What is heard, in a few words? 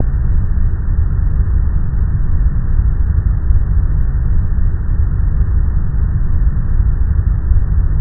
Under The Bridge, ambience